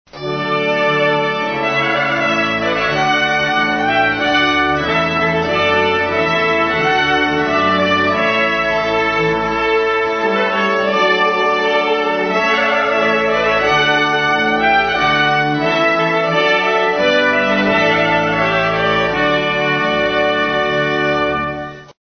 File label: Trumpet; Clarke